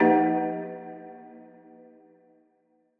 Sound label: Marimba Hit